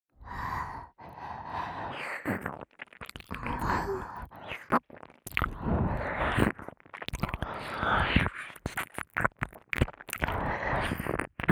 (右)舔耳_吮吸; 仅仅是沉溺于舔舐声中; 舔舐声特化

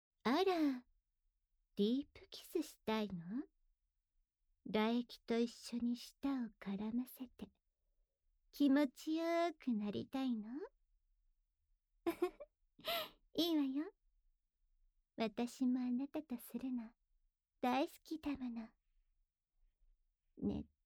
舌吻; 戀愛用舌吻

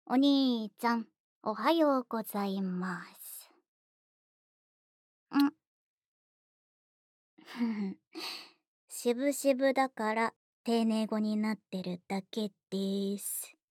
早安的親親 葛格ver; ヒネくれて育った妹に友達マウントでデートに誘われていいようにマウント取られてしまったある日のデート日誌

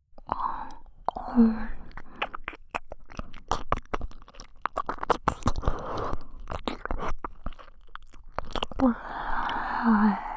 超长舌头的真格舔耳（左）, ASMR; 黑暗精灵

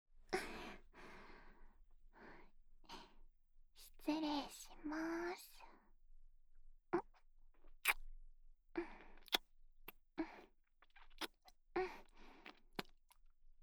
耳边低语舔耳 右耳; 枫带来的热吻后在耳边低语舔耳（右耳）; 公寓管理员 ～用身体支付房租的夜女郎和辣妹 ～

